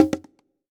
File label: Bongo item pick; FREE SFX PACK VOL 2